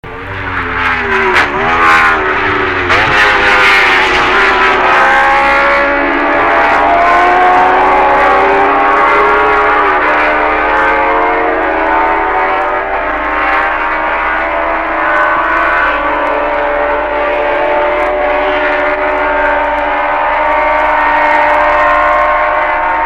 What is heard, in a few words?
MV Honda 4; 1962